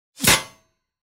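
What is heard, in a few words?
strike of sword on sword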